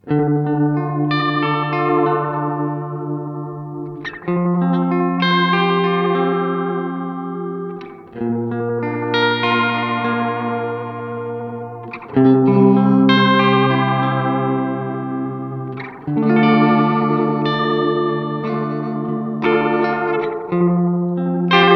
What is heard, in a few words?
Echosex 3 Modulation; Gurus